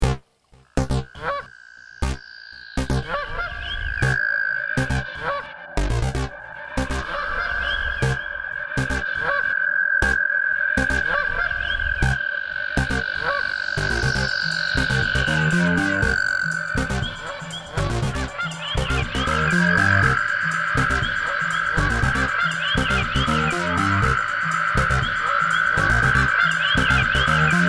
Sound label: honking